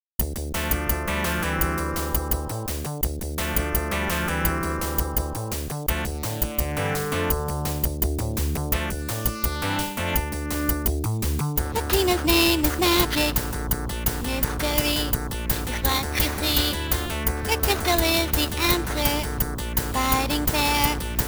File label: Vocal Intro English Ver.; psycho soldier; msx; Psycho Soldier Theme